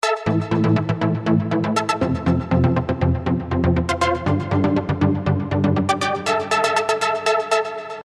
Fuzz; Gigaset; GS290

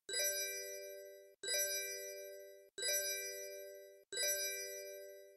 Calendar, notifications; Doov A8

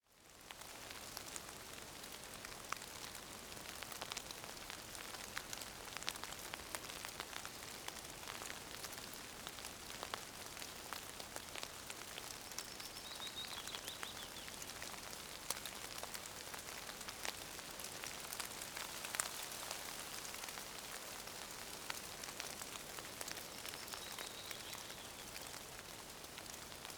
Stream

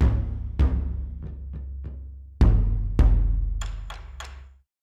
Drum

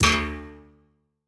low battery; Smartisan OS 0.4.0

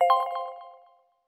Content Flush; LeEco Le 2

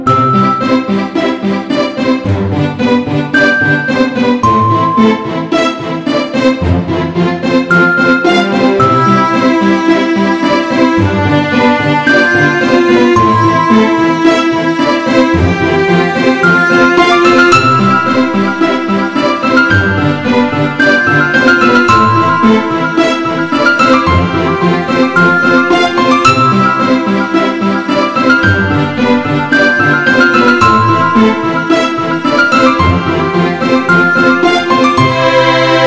Classical Theme, ringtones; LeEco; Le-2

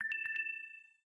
Low Battery; LG; Optimus-L90-D415

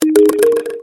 Alto Tones, notifications; Infinix XOS 12

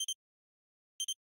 selftimer; FCNT Arrows Alpha

